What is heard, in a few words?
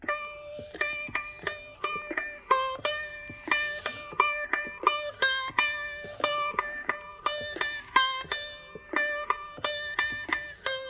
Sitar Loop; Vignette Kit